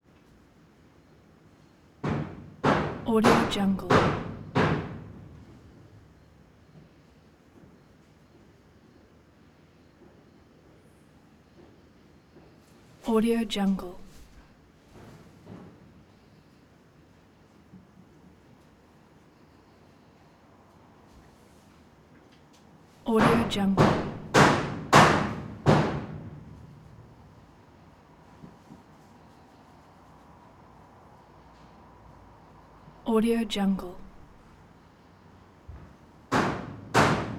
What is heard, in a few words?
barn horse kicking stall